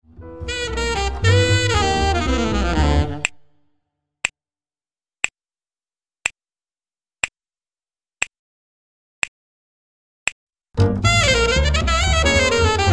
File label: minus drums snaps; trade 8s with alto (alto first) 1 chorus